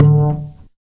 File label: Upright Bass Notes